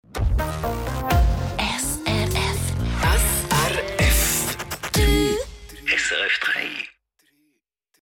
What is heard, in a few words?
STINGER; SRF 3; DAY trunk party